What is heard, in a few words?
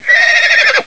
Epona